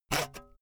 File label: saw use short